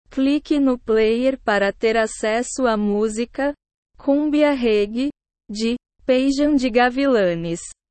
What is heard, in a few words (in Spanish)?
cumbia reggae